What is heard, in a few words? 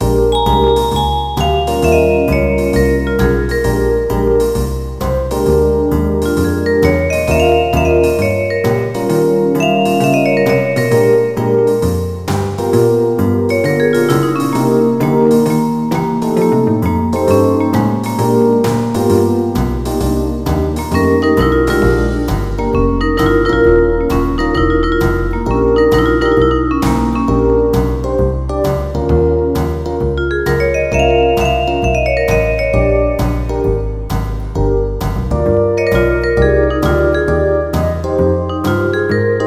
blues; National Parks of America; midis